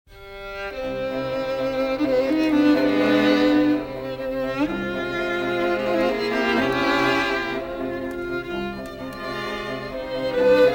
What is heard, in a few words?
strings louder; beethoven; cloudbounce